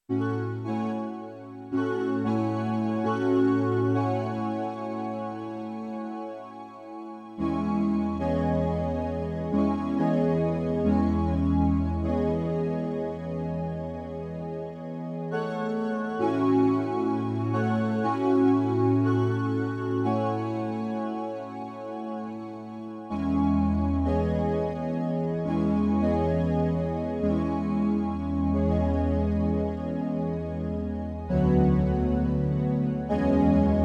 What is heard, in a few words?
SLEDGE dark velvet; Sledge : son à accords préparés, une ambiance envoûtante qui dépend de votre jeu